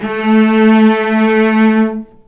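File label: cellos attack A3 L, String; arco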